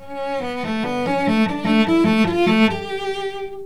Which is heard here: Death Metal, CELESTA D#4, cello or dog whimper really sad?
cello